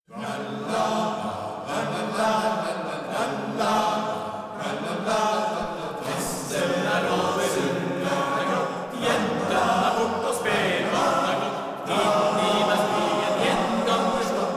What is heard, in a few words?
Mazurka